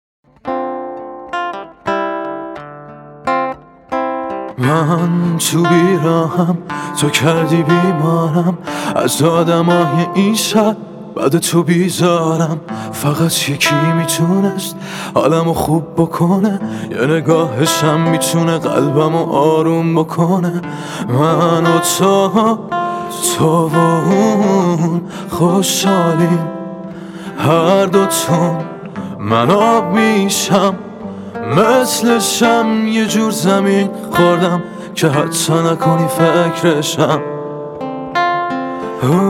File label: Acoustic Version